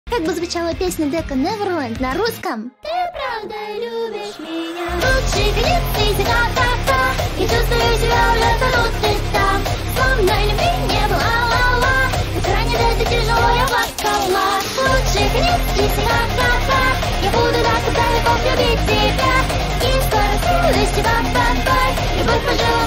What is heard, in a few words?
rus cover; vocaloid; vtuber